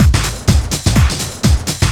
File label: Deep House 125bpm